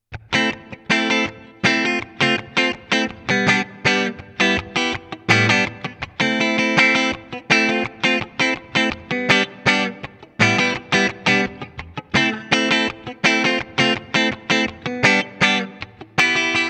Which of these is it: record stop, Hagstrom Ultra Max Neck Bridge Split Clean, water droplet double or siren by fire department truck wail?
Hagstrom Ultra Max Neck Bridge Split Clean